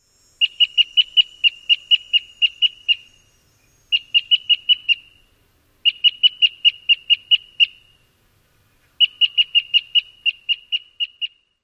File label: calls; NY